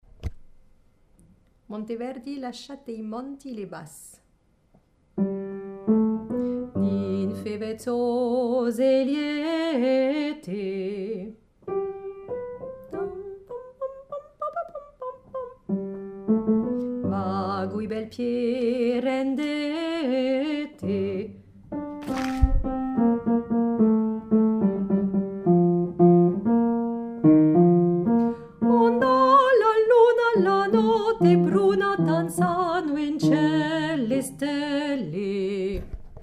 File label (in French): Basse